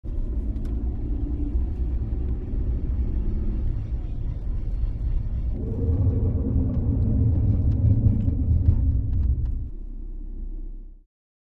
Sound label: Car Breaks Start Sto, brakes